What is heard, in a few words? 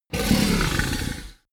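fishman breath grunt, creatures; kreaturen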